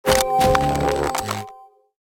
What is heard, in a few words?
Podium SFX Synthwave